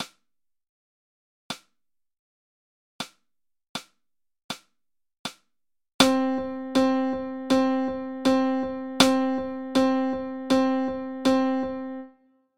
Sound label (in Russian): Пример восьмые